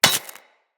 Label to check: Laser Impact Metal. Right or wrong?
Right